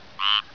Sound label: squirrel treefrog call; texas nature trackers; amphibian watch